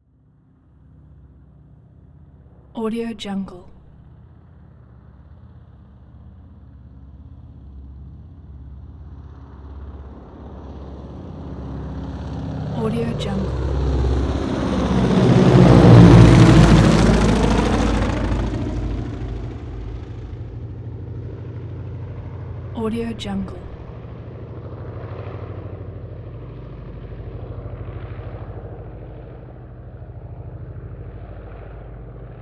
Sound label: transportation prop plane ju52 fly by overhead from behind